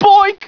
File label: bust concrete, debris; svencoop; cracklife